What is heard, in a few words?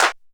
Yamaha RX15 Clap